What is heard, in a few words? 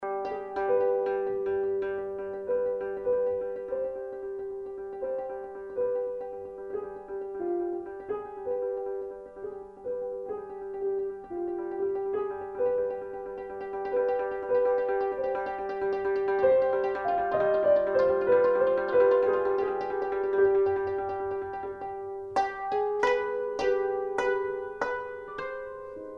Duet, Klezmer Band